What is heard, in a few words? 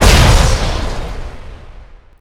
e hit, combat; airpod